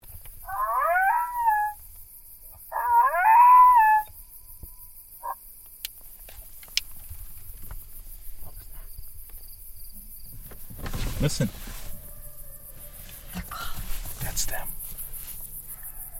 Coyotes Quabbin reservation Massachusetts early evening in July